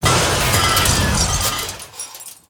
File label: Vehicle Tank Underlay Death